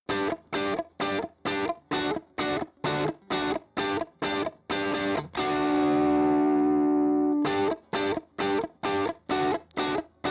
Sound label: Gtr Unmixed